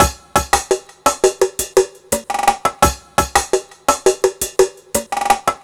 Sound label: 170, Drum Loops, Drum & Bass